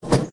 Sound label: cart rail; minetest; boost cart